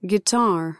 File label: guitar